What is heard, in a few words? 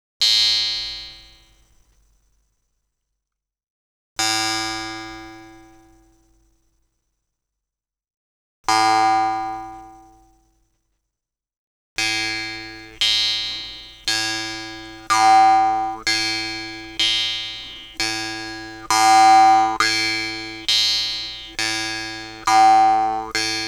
sound jews harp set